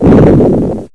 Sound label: rumble; pygame shmup